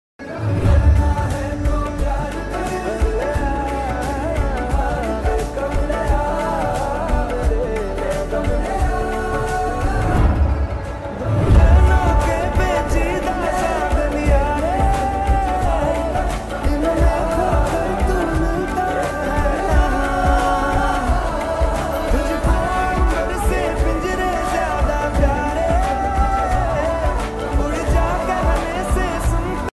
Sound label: slowed reverb ringtone romantic love ringtone